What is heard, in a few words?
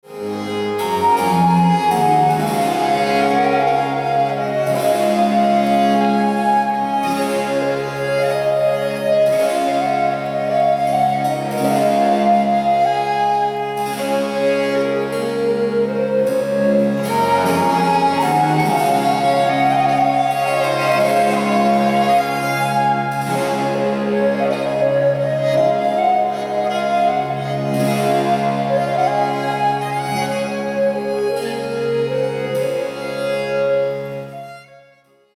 musette in D; Jean-Fery Rebel; Les Caracteres de la Danse